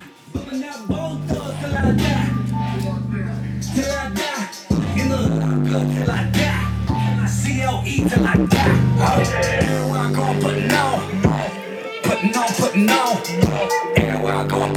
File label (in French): musique ambiance